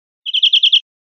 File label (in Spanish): chorros cortos campaneados, verderon